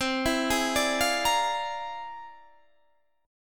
F Minor Major 13th, C

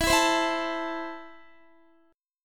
E Suspended 4th Sharp 5th E, down, Guitar; Esus4#5 strummed

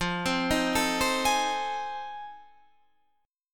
F 7th Sharp 9th, Guitar, Half Step Down; F7#9

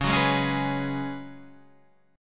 C sharp 7th Suspended 4th C sharp

